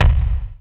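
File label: SLAM KICK SOFT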